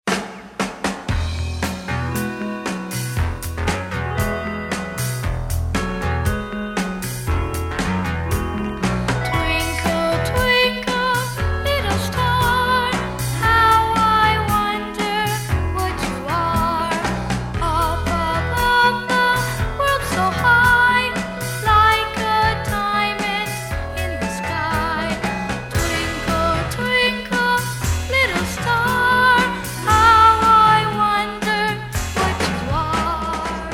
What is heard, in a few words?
birthday twinkle